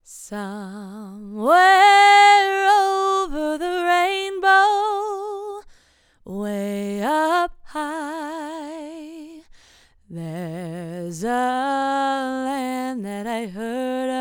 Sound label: FEMALE VOX TUBE